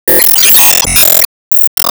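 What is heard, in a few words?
Score Battle Start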